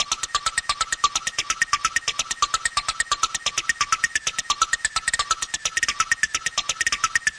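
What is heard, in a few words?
minor stacatto synthperc, 130 bpm; SYNTH AND ELECTRONIC LOOPS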